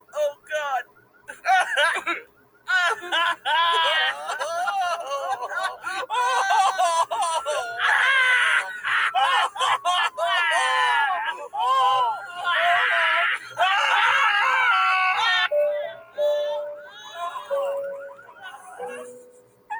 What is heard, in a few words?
crying